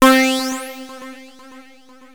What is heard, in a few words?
Access Virus B; Synthworx